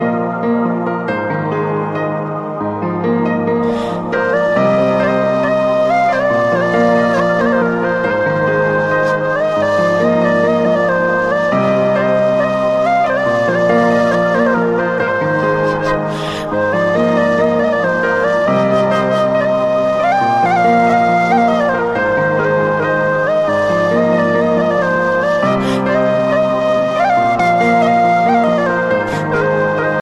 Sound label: Flute